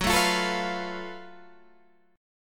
F sharp Minor Major 7th Double Flat 5th, Guitar Standard; strummed; down